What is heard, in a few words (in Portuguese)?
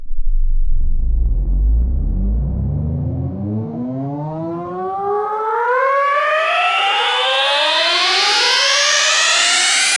poder de aceleramento, time travel